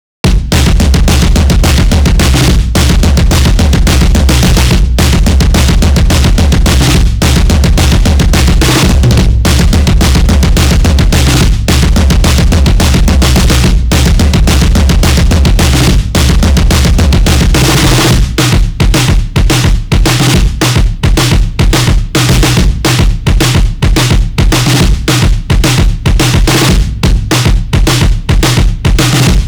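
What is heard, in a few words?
drums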